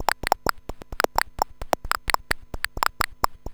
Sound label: Rhythmic loop, 90BPM; musicradar; bitcrushed; Crushed Loops